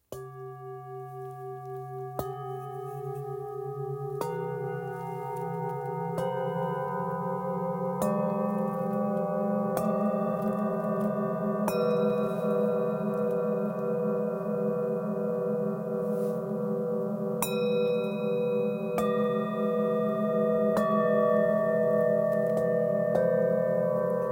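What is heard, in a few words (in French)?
Set, bols chantants tibétains; crepuscule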